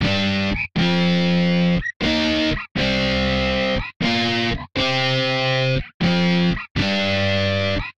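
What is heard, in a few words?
Guitar Rock G minor 120bpm; Guitar and Bass; Ableton; Factory Packs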